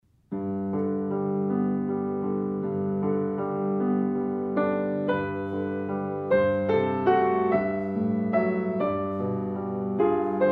piano seul; mendelssohn 2e mvt

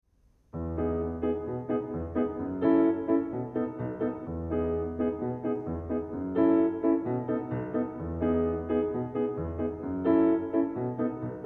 la cigale et la fourmi piano

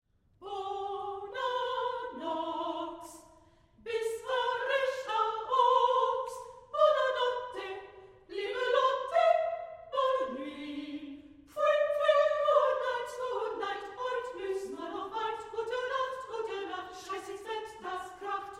tutti; bona nox

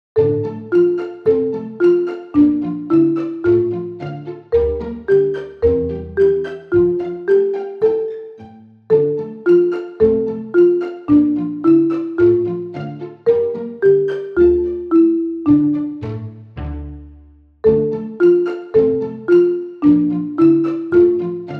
automne instrumental

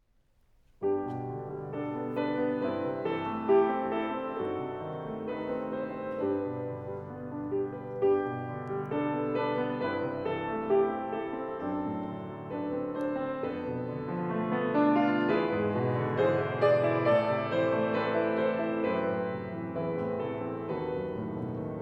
chant; le chant des oiseaux

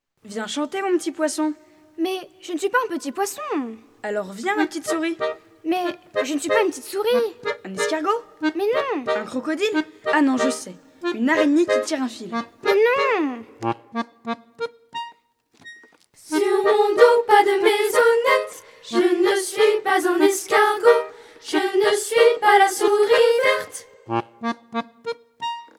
voix accordeon

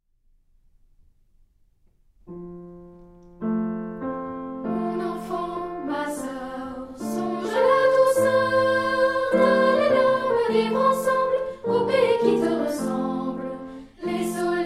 piano chant; nouveau monde 1er mvt

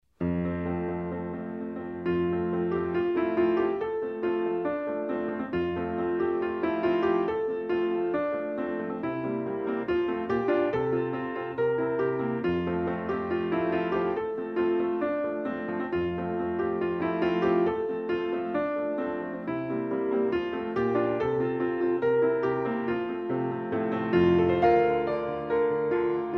piano seul

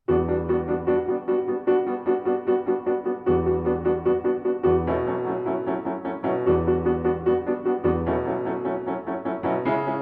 piano solo

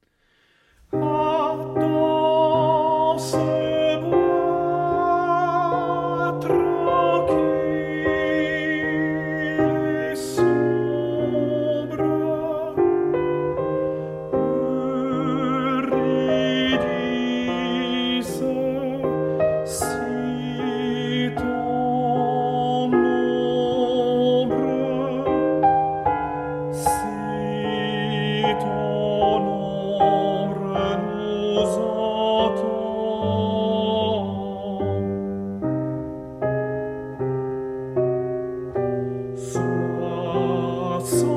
tenor; gluck; ah dans ce bois tranquille

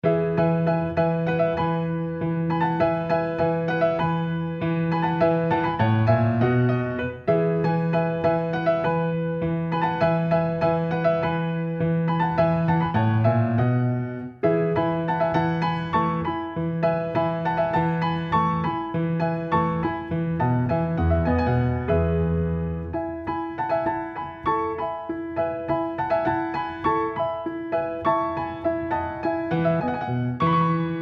piano seul

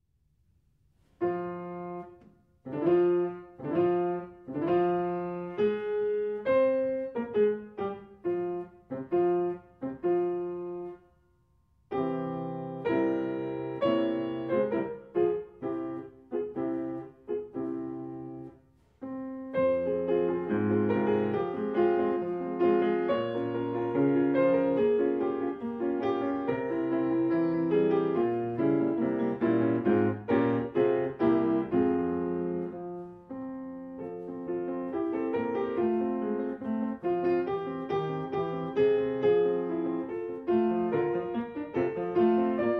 beethoven 1er mvt piano seul

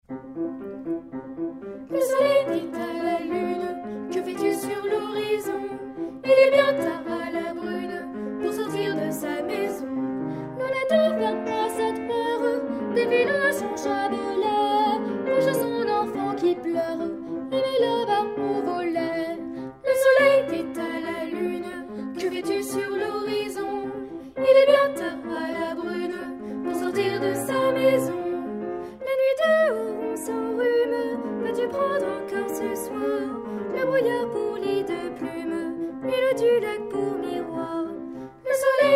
piano chant

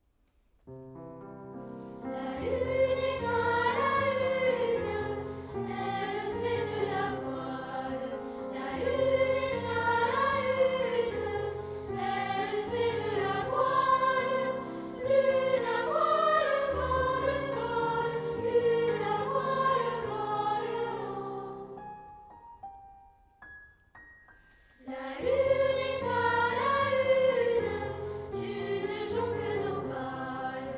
voix piano

